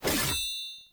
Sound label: Two Handed Axe Whoosh Stab; Weapons; Enemy; Axes; Sinecure Two Handed Axe